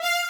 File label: violin